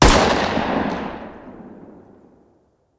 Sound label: fire 45 dist, uzi; Urban-Coalition; Decay; arccw ud